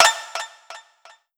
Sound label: 10sec; Disney Star Smash; Android, iOS, Mobile